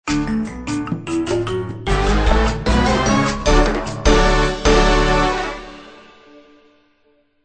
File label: victory music